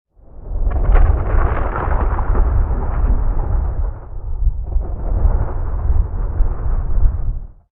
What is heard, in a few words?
Bridge Rumble, ambience